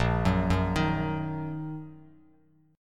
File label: A sharp Suspended 4th Sharp 5th down Bass Standard; arpeggiated; A#sus4#5; JGuitar